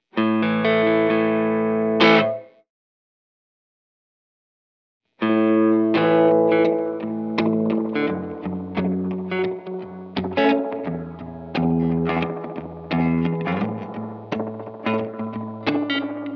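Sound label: Vintage Oil Can Echo, A nearly-maxed out Regen paired with minimum Time and a 50% Mix creates a tone reminiscent of slap back echo mixed with a bright, present modulated Spring reverb.; mode creates almost Spring-like tones and reverberant echoes; Oil Canary In A Coal Mine; Olivera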